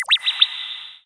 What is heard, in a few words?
symbol